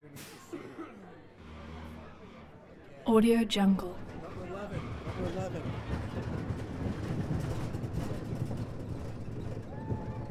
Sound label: roller coaster interior of car